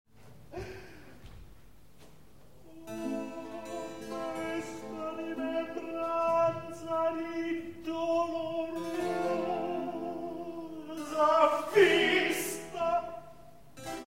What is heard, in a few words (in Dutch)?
klacht fragment